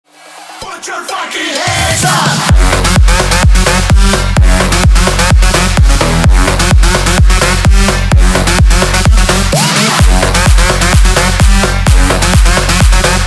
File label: slap